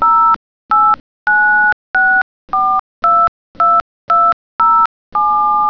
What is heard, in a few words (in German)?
Tonwahl